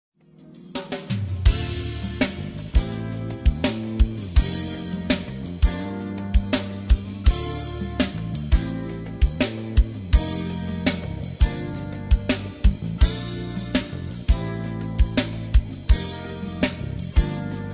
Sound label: jazz pop